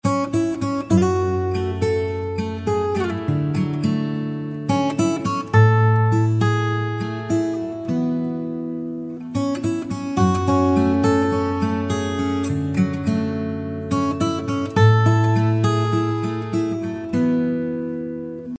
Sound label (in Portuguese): violao